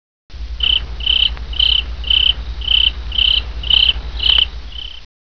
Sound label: bug